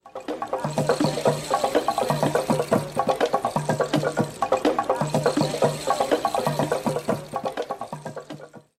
Bamboo Instrument

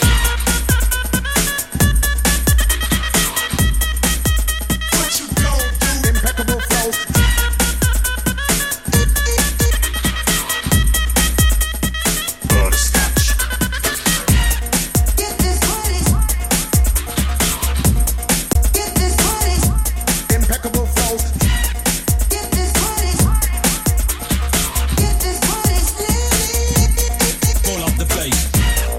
Breakbeat

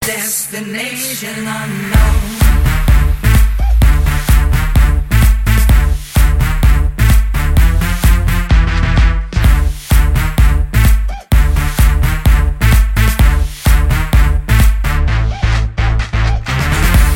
Bass Destination

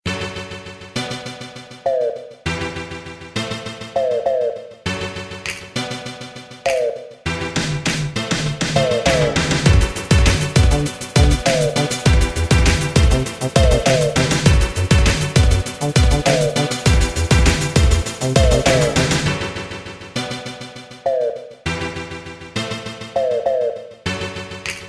Pipe Dance 90s

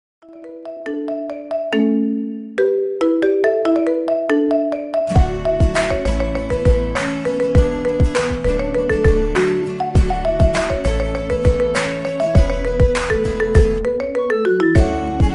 Marimba Remix

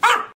bark

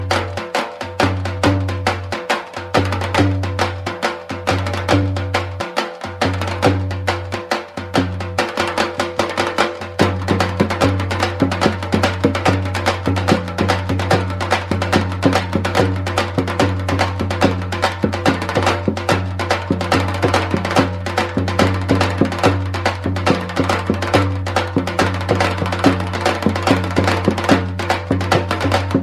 bhangra dhol

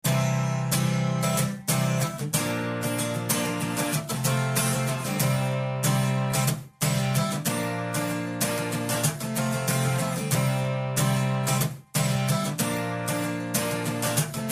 guitar music; blues

